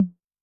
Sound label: Analog, Tom, Yamaha DTXpress-II